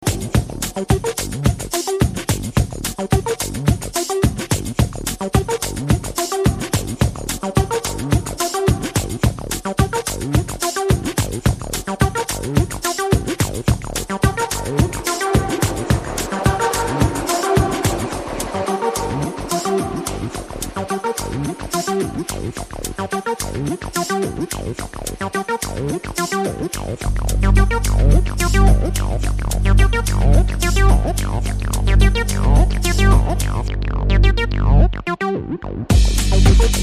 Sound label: SlowMo Acid